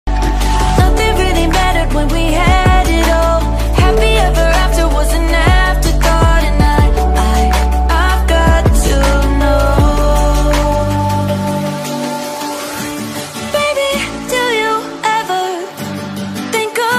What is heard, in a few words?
hollywood english song ringtone